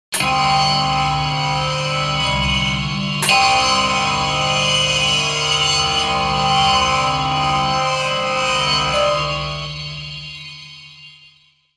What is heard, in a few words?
knife strike